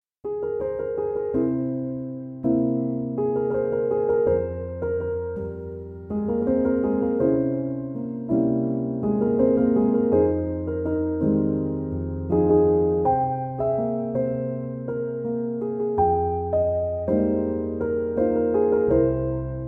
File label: Piano Instrumental